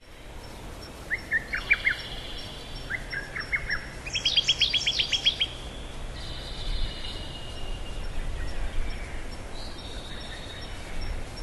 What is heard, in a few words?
chorus